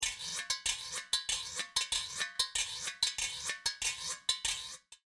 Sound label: Scraper 95bpm; BeatHive